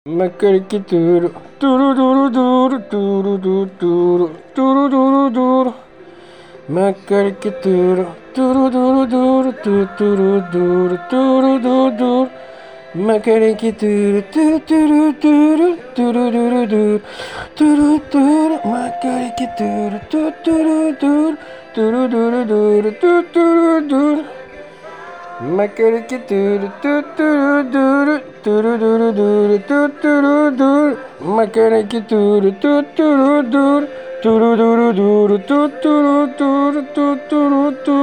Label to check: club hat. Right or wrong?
Wrong